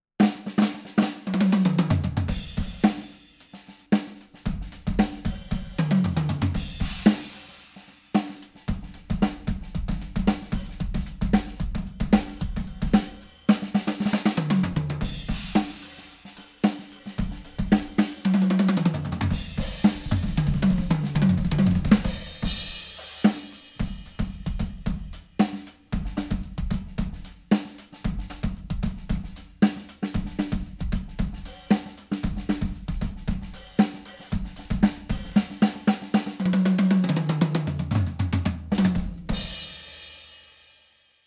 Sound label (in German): Set2 mit Snare 14˝ x 6 5˝; Fame Crystalicca